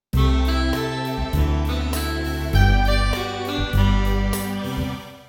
rock ballad in g

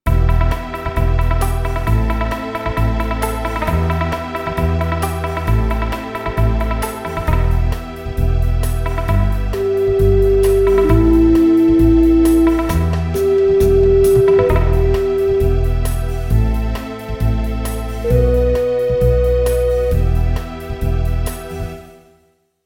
Rock Rhythm in Em Strong Strings w. Git 133

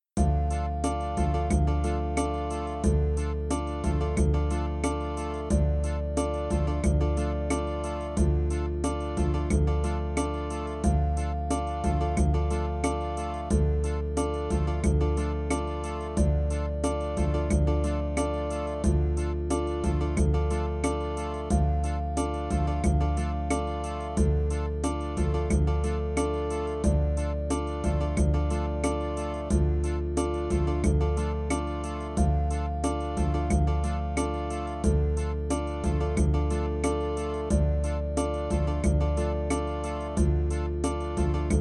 Campfire Ballad in Dm

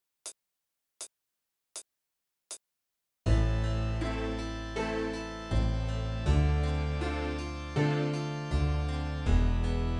Am Cad w Dm7

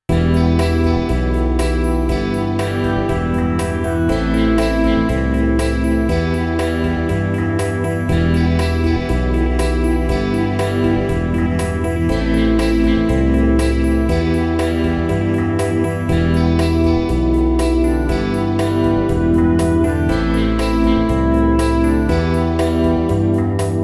Big Ballad in Em, Synth Band 120

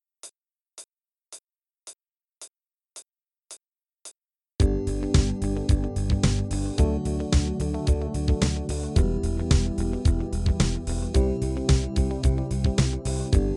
Easy Rock

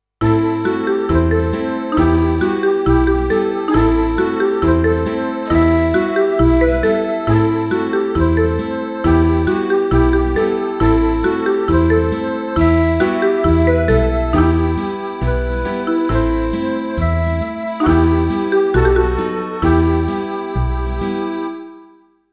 Em Riff folk 136

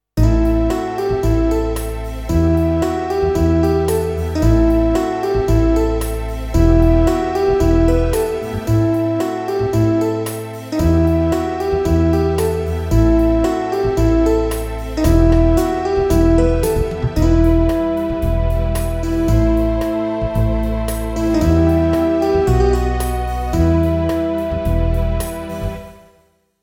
Em Riff Rock Ballad 113

